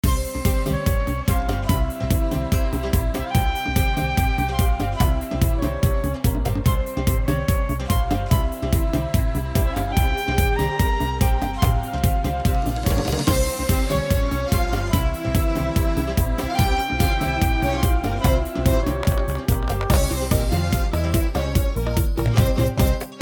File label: freespin melody; Enchanted Cleopatra